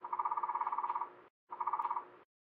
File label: Bird; Lethal Company